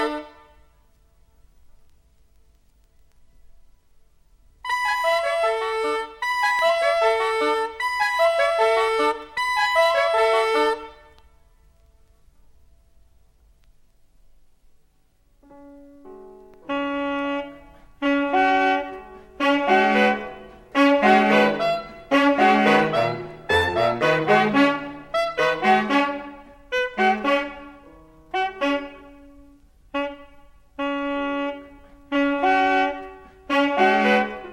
Trains & Garden: Gardens & Trains: Carillon. Bells & Saxophone: Near & Far; The Etaux Carillon